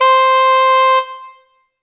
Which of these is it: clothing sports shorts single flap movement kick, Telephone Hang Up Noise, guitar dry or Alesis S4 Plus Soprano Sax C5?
Alesis S4 Plus Soprano Sax C5